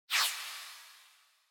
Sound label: Motion Agility High Reverb; モーション 俊敏; 高 リバーブ